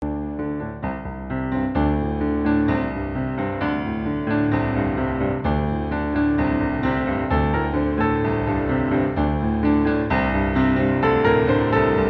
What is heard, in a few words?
Piano Cover piano; synthesia